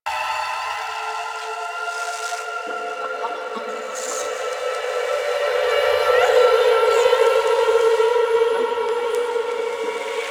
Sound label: Angel Song; Lotus Chamber; Warframe; Quests; Zariman